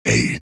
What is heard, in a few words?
Monster Grunt